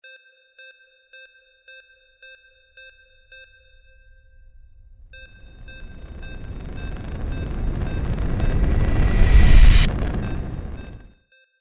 sci fi magic fire end; windy beach audio

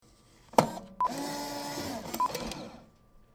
Post Franking Machine

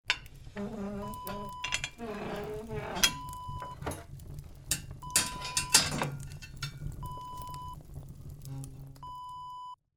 fireplace door open

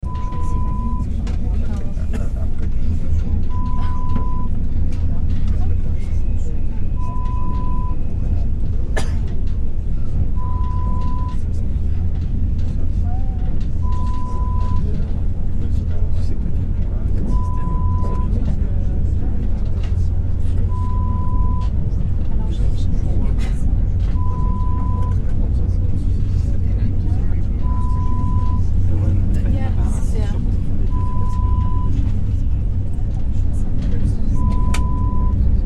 Eurostar Internal Ambience sound

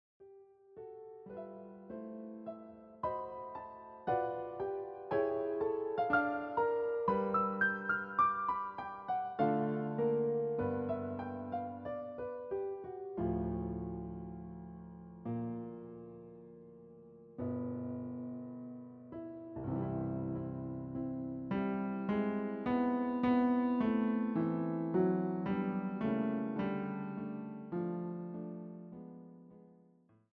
piano transcription of the song; I Love Thee; Grieg

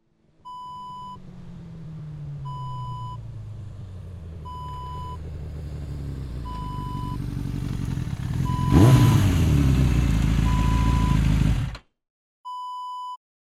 motorcycle drive up

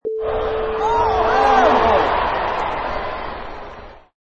Arena Crowd Yelling

